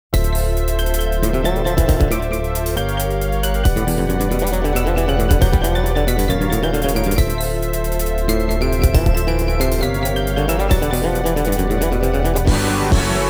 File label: Opening Attract ~ Title Call; Namco Game Sound Express Vol.25; Alpine Racer